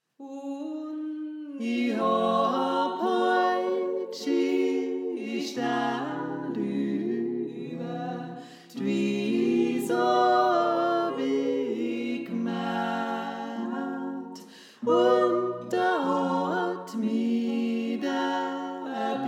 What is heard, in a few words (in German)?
Tenor; Und I Hab Halt Schö Staad